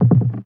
One Shot, Hits

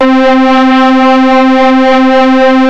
PULSE C3; OBMX-SYNTHS

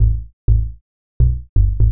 HOUSE BASS 125 A

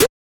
Glitch, One Shots, Glitch FX

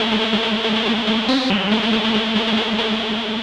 TECHNO SYNTH 140; Keys

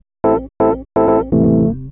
house synth 125 A, keys

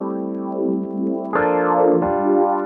PIANO 90 A; old rec

